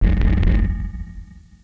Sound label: thump